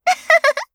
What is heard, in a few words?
laugh; Eternal Return; Garnet; KOR